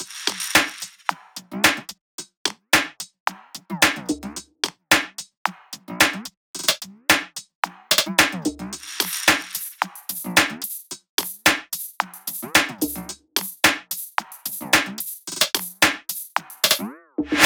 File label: loop top 110, Seismic Loops